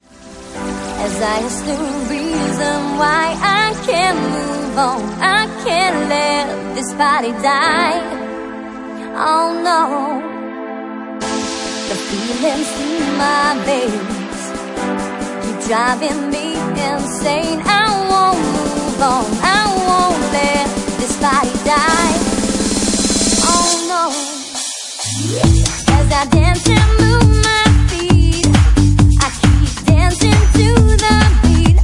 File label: Organ Funk Remix